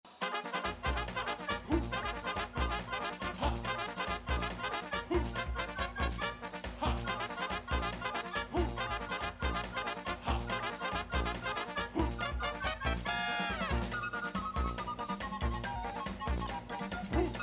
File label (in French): Musique finale